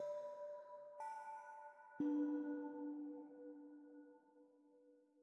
Citadel Bells; Frozen Flame; windows

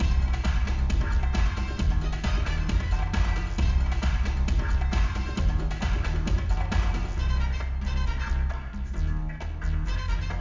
Club Music

